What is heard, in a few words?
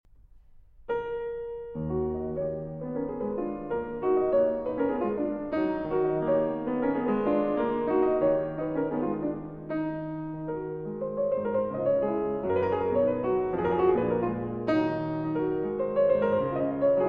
Mazurka In E Flat Minor